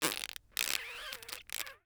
Human Farting

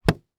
Impact Blanket Hit By Hollow Tube; Impacts

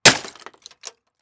Impact Plastic Smash

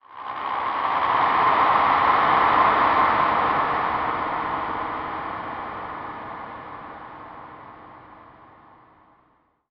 Transition Stab Dark Medium

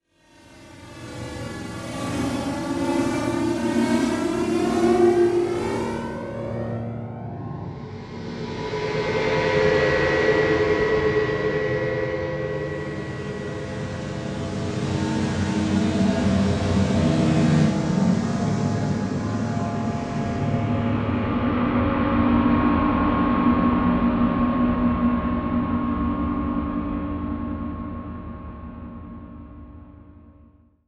Slow Spaced; Module Production Element Title Imaging; Imaging Elements

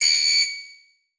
Beep High Pitch

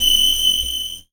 Beep Long

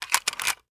Weapon Gun Movement; AdobeCC

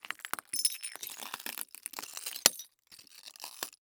Foley Footstep Glass Walk On Debris; Foley Footsteps

